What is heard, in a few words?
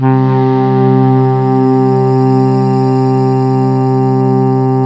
SAXY C2